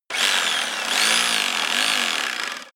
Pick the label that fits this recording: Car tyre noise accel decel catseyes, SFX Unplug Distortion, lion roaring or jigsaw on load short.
jigsaw on load short